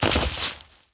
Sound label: Bodyfall Leaves; SFX Kit; Bodyfalls